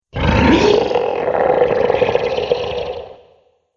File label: Dragon Carnivore Growl, monster; Monster Sound Effects